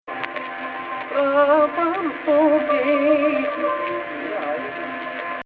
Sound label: AHH, Egypt Rast